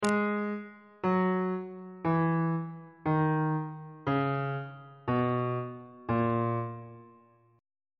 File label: b flat locrian mode descending on bass clef